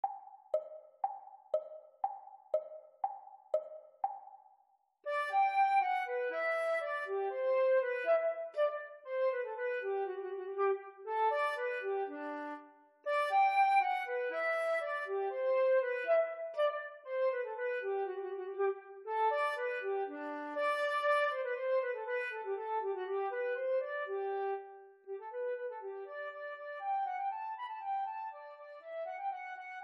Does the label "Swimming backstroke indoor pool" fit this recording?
No